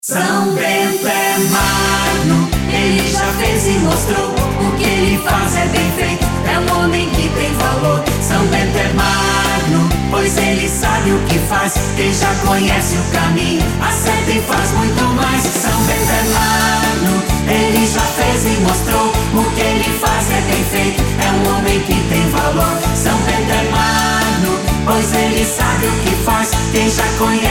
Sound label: Jingle; S. Bento Magno